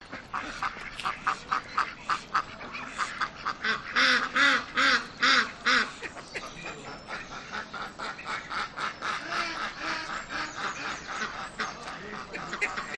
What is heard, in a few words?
urban farm duck calls